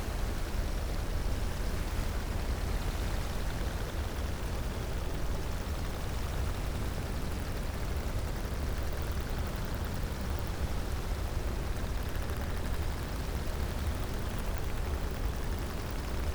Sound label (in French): cachalot positif, Physeter Macrocephalus